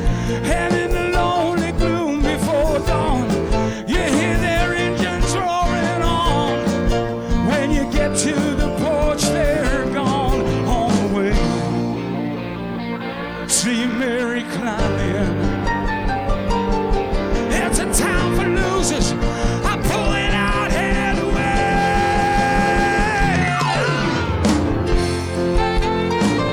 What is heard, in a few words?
zoom h4n live show